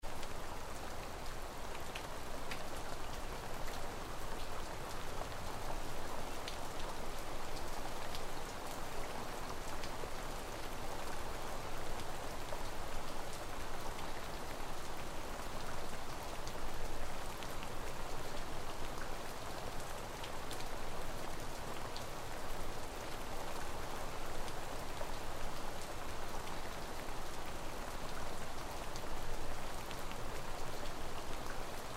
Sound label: rain